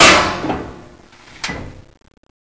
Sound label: metal, debris